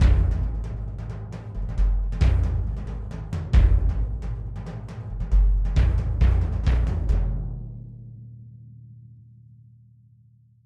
Cinematic

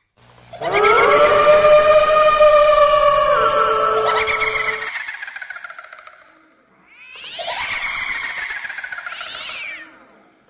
scary night

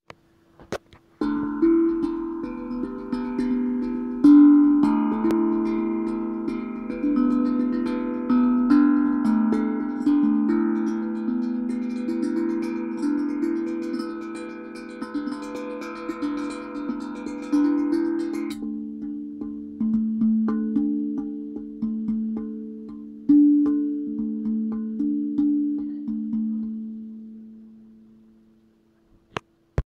Tang drum

